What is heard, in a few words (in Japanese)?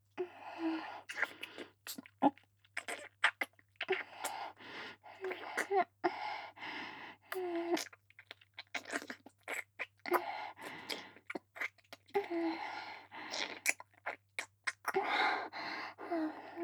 ディープキス, ASMR; 【甘々媚びアクメ】性欲旺盛な陸上部の先輩後輩JK、ベロチュー青春溺愛えっちでコーチの汚ち◯ぽ生ハメ特訓♪